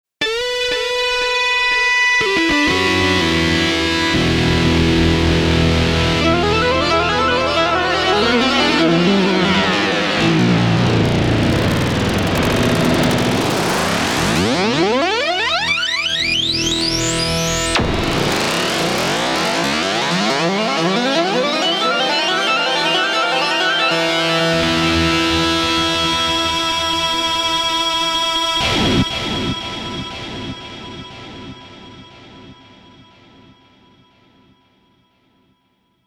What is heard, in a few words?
Spiral Feedbacker; Montage